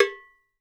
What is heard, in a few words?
COW MU; Sampleheads; NYC Percussionworks; 90 s Sample CDs